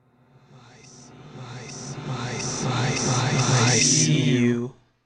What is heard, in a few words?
creepy